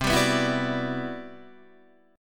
C-Major Sharp 11th, Guitar DADGAD

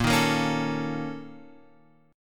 A sharp Minor Major 7th Double Flat 5th

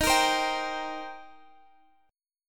Eb 6th Eb down Guitar Open F; strummed

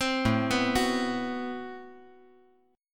A Minor 6th Add 9th A, Chord

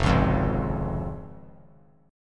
F Minor Sharp 5th, chord; Fm#5 strummed; JGuitar

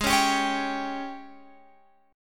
G sharp Major 7th Suspended 4th, Guitar, Open F; strummed; G#M7sus4

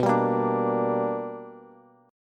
B Minor Major 7th Sharp 5th Bass; strummed; JGuitar

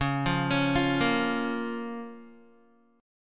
Db 6th, Guitar; arpeggiated; tuning=4E,4A,5D,5G,5C,6F

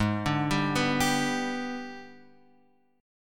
Ab Minor Major 7th Flat 5th, down; AbmM7b5; arpeggiated

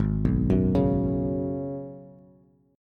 Ab Minor 11th, Ab, Bass, Bass Standard; Abm11 arpeggiated; JGuitar

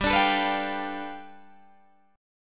G 9th, Guitar Open D

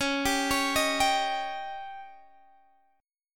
C sharp Suspended 2nd Flat 5th C sharp; C#sus2b5; arpeggiated; 21,22,23,20,20,x

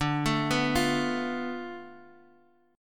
D Minor 6th Guitar Half Step Down

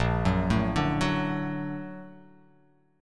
A sharp Augmented Major 7th A sharp, guitar

